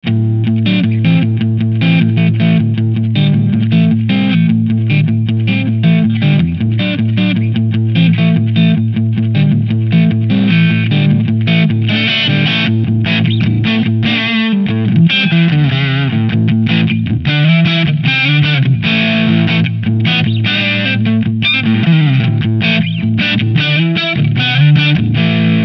Preset Plexi Drive

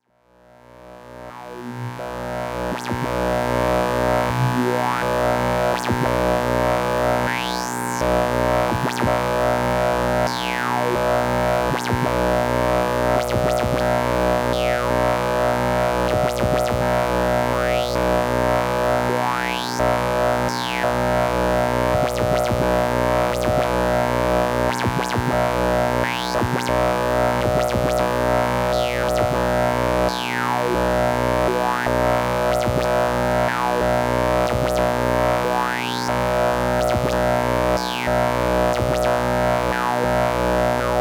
LFOs Switching Music

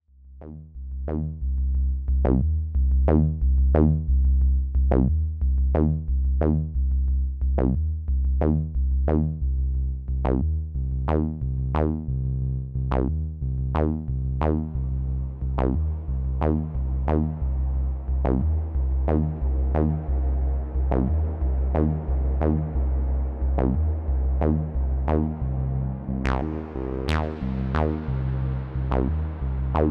Uno Synth Pro X; Kunstoffbub

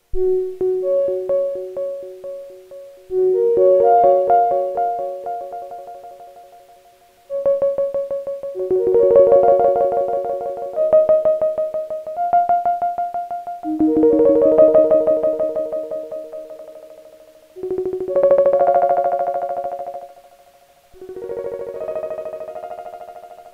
Microfreak piano 2 hit engine

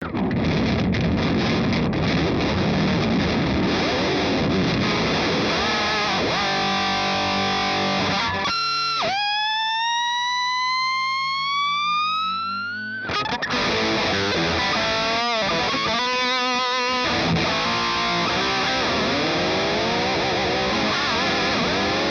Floyd Rose Action

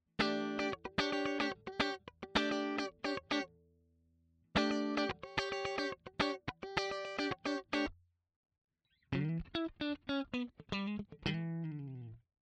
Funk DI Balaced Out

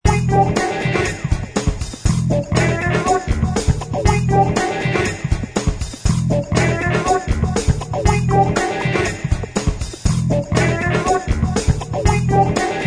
Pick a Knocker GROOVE

